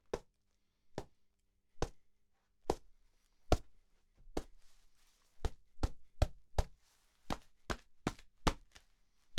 Gloved Punch

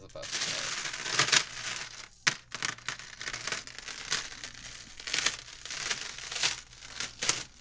Metal Chains

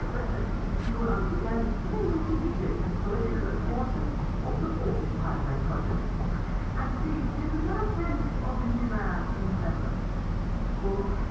Television

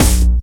Jump

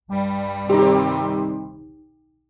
Game Fail Fanfare

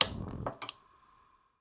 Lighting A Gas Stove Cooker

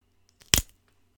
Bone Snap

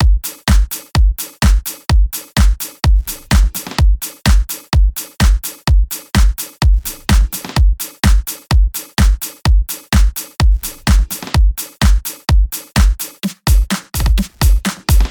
House Drumloop

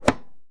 Chop

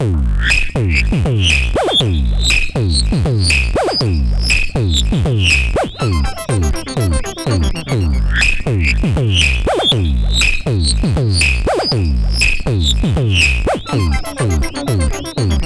Scratchin Loop

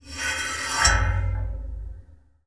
Metal Resonant Scrape Impact

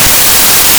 High Pitched Static

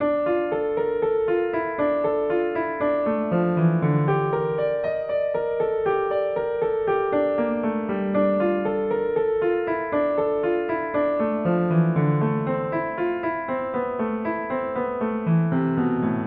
Ominous

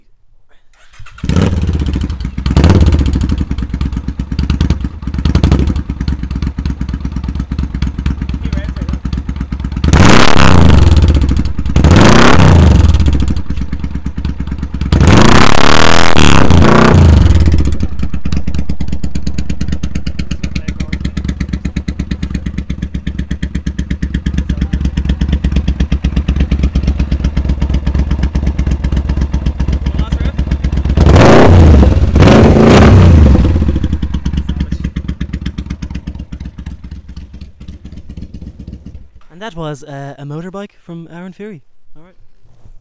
Bike

